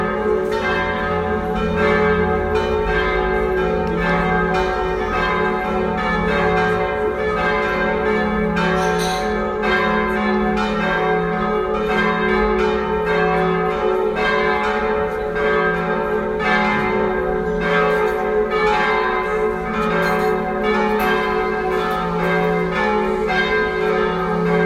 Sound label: bells belleville